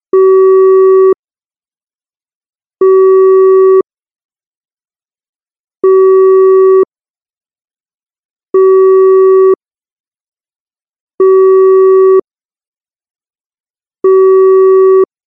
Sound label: calling